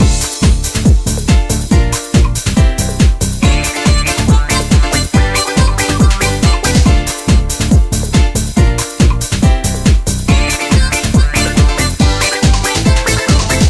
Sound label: ringtone dance, instrumental